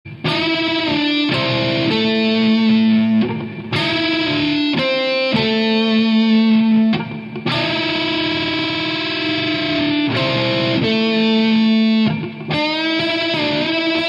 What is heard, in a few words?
guitar minor pentatonic wail; online lessons